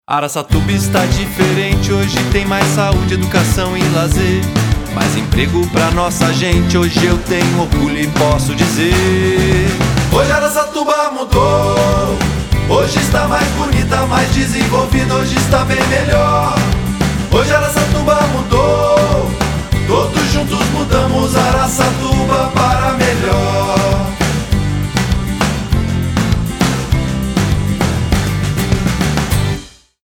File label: Jingle